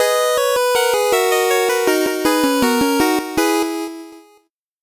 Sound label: 8bit jingle slow